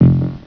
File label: Pulse; Star Trek